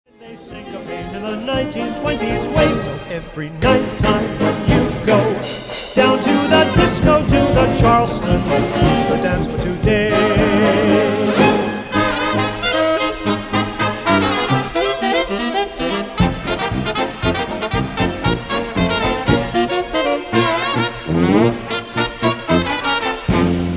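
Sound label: charleston at the disco vocal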